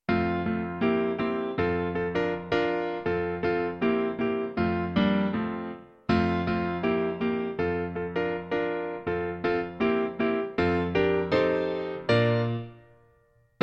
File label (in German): Hymne playback mit Vorspiel; Ambergerhymne